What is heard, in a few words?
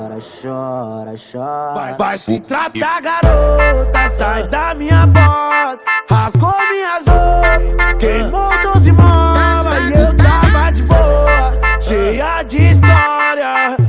music